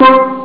wilde glocke; ST-XX Amiga Soundtracker Sample Packs

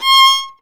GEIGE, Alpine Volksmusik

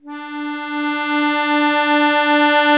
AKK VIOLISW, akkordeon; Best Service; Alpine Volksmusik

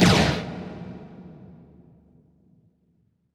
RAKETE; Best Service; Advanced Mega Effects

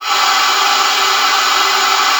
LUFT, OB D.AMORE; Xsample VOL-7

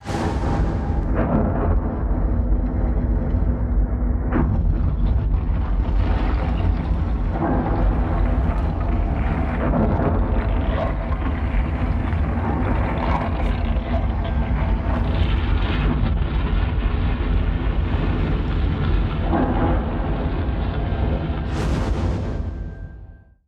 RAUMSC; Best Service; Advanced Mega Effects

